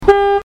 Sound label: Ford Falcoln